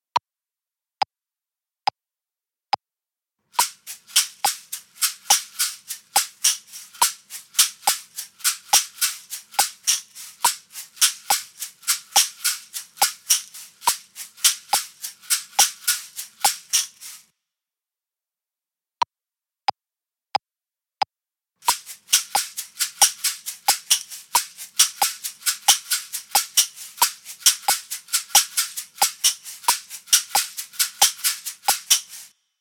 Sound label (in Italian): Con due Caxixi Differenti